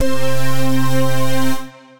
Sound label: big smack pad lead; Synth Lead; Ableton; Factory Packs; Beat Tools